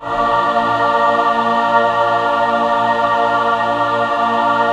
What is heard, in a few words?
BIG MAJ A#4; BIG CHORD